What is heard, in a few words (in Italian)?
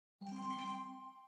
trillo